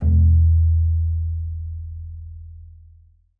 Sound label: E1, bass pizz; Xsample VOL-5